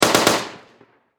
Warfare Machine Gun 7.92 Caliber Mp44 Stg44 German Short Burst Distant Perspective